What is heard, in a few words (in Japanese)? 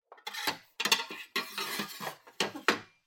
包丁をしまう音